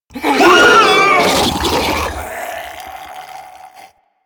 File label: Infested Jetpack Pain; Warframe